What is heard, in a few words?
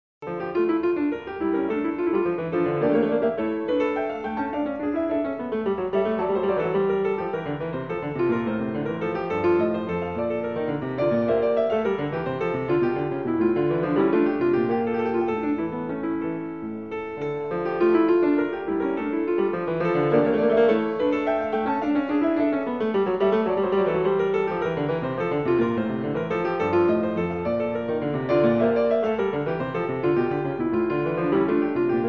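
courante, piano; BACH; FRENCH SUITES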